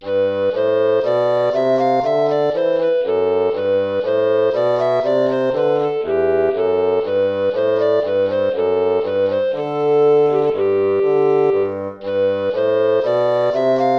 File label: baroque minuet major